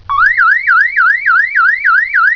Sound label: CAR ALARM